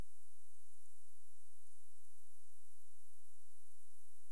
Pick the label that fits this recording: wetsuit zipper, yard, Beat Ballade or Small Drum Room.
yard